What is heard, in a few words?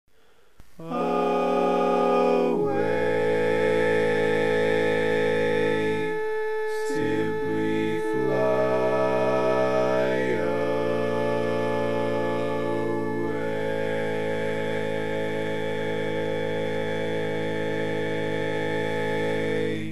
Bari, barbershop tags